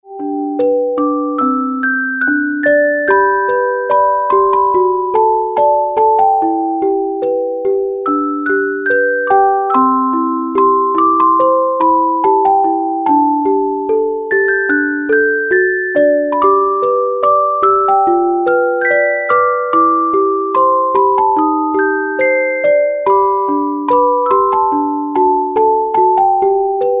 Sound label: musicbox sweet tune